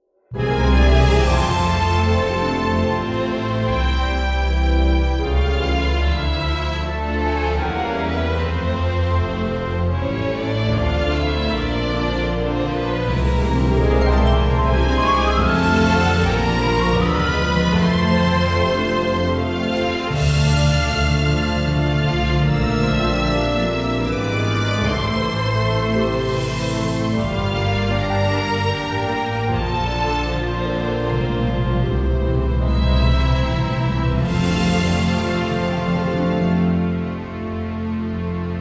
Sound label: Romantic Theme